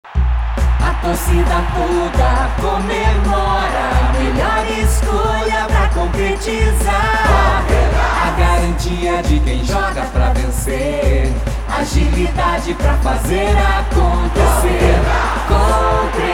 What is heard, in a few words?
Jingle; Concrebras 2008